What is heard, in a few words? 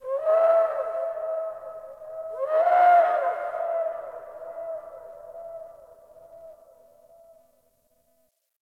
Distant Zone Three Creature; Warframe; Ambience; Eidolon Plains; One Offs